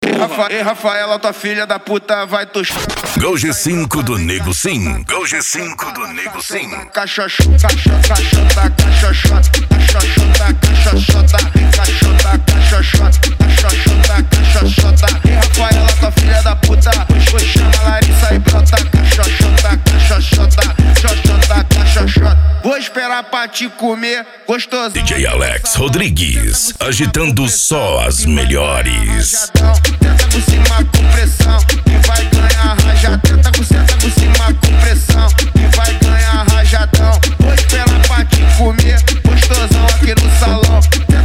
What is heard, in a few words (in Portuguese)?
funk mega bass e noia dance